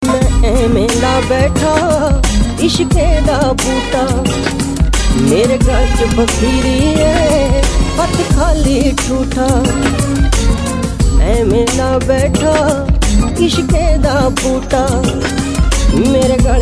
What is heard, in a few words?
punjabi ringtone